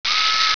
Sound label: zoom, sniper